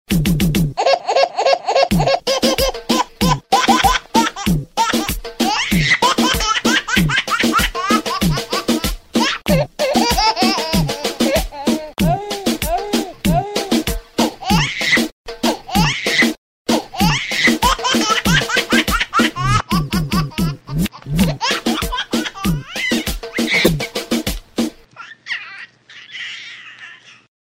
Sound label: Baby Laugh Techno